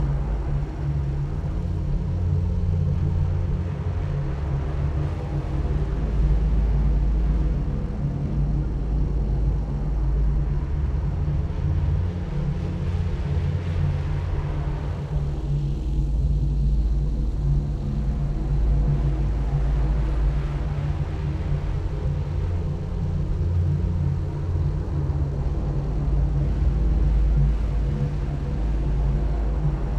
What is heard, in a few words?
ship construction ship idle; Stellaris; toxoids